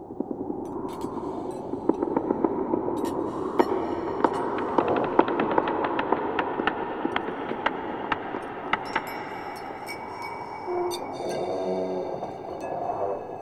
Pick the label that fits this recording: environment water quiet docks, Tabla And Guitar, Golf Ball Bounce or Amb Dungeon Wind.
Amb Dungeon Wind